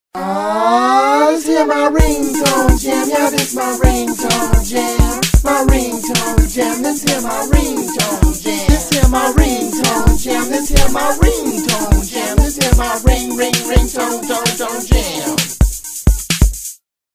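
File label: cheerful ringtone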